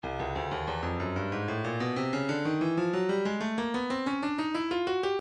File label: stylus breakbeat midi 093 bpm; Jamma Gyro